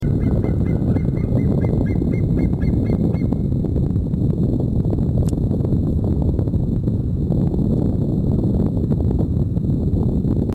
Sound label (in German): Ruf des Adlerpaares